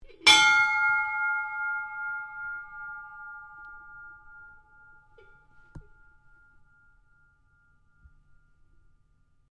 SHIPS BELL DUMB HOPPER BARGE RING

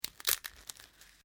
DRY BRITTLE SNAPPING; Various Organic Foley sounds

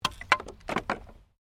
Wood Drop

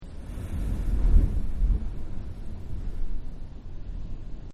Whale Blow Hole; Whales; Animals

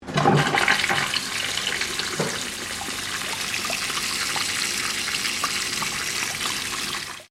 TOILET URINAL COMMERCIAL AUSTRALIA FLUSH; Toilets; Bathroom; Household; SoundEffects-Foundation4

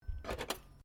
cutlery general movement, Household cutlery; Kitchen Sound Effects; Kitchen (Sound Effects:Household)

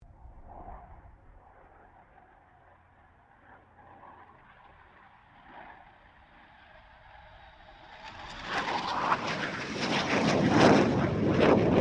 BAE Hawk 127 pass; Airplanes

